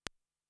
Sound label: Cym; Sonic-Potions LXR; Electro